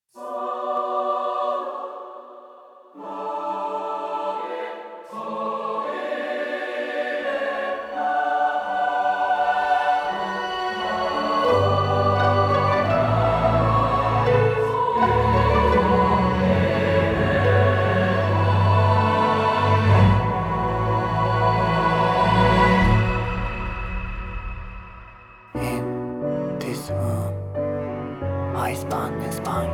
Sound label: Ocean of Strings